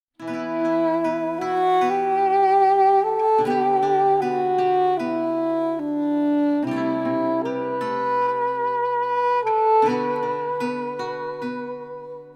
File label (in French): Ambiance feutrée